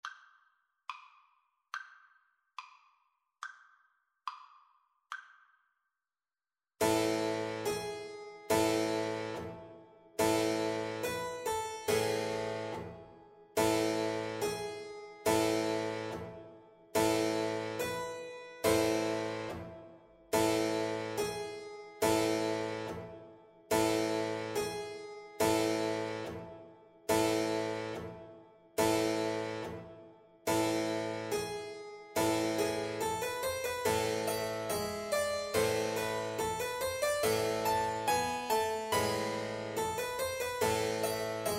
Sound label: tambourin suite in Em, Alto Recorder